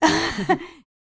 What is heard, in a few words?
LAUGHS, Jazz Fx, Vocal